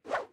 whoosh; Dorothy And The Wizard Of Oz - Cookie Magic

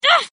hit; april; Power Rangers vs Teenage Mutant Ninja Turtles - Ultimate Hero Clash 2

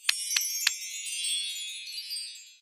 restart click heels; dorothy; run-dorothy

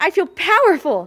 win; pranger graffiti

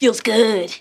win; hdanger sticker

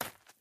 run step l gravel; Ben 10 - Hero Time Game; ThrillGames

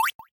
Bombs Away; Squeaks; Bugs Bunny - Batter Up!

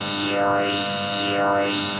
bzz long; Phineas And Ferb - Backyard Defense

